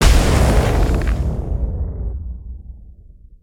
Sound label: Maelstrom Snowman, destructibles